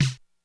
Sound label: Snare, Korg DSS-1 Drums